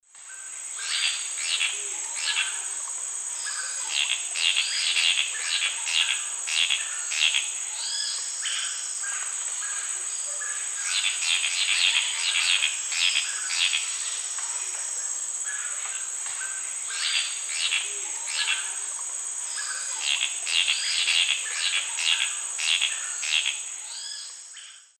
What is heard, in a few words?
Tityra semifasciata, calls; S. America; Eastern Lowlands